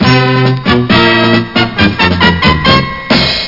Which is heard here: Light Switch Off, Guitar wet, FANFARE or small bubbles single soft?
FANFARE